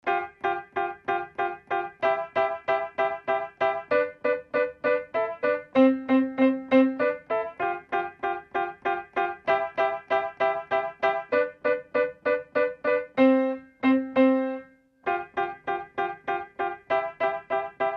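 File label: Piano Play Chopsticks; pianos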